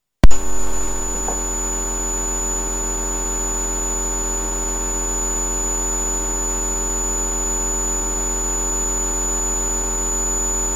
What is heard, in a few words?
thumper vibrations